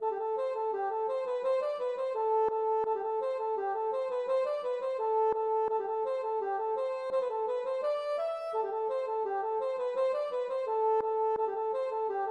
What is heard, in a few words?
gavotte